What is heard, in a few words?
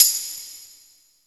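TAMB, tambourine; East West - Phat & Phunky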